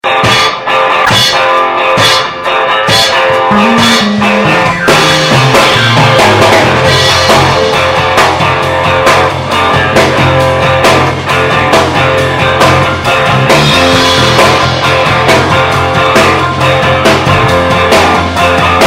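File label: Fender P Bass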